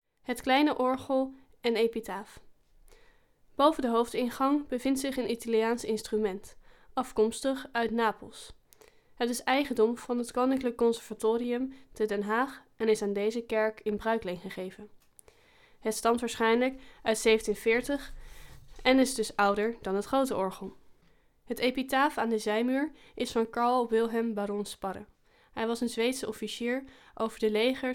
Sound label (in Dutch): Het italiaanse orgel; epitaaf